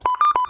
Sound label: Locator Lo Hi Lo